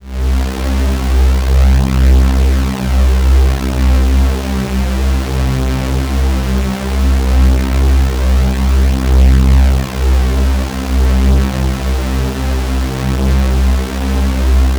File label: C1, Korg MS-2000; Synthworx